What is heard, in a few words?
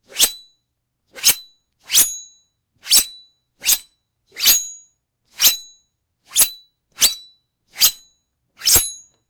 ninja daggers slashing